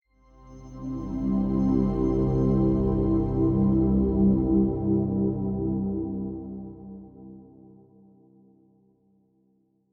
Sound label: Stinger